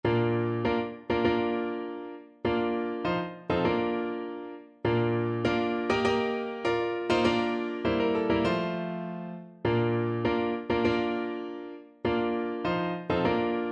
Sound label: bridal march, piano